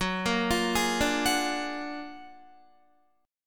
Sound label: Gb Augmented Major 9th Guitar; Gb+M9 arpeggiated; JGuitar